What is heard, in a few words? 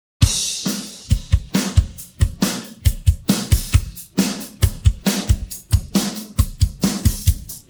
pattern, drums